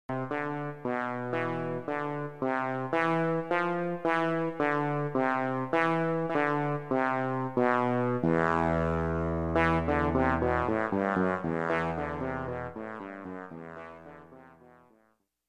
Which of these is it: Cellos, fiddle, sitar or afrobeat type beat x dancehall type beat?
sitar